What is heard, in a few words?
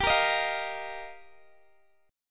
G Minor 7th Sharp 5th G down Guitar DADGAD; strummed; Gm7#5